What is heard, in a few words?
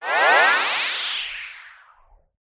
chord ripple into hiss resonant descend magic shimmer whisp; Skyclad Sound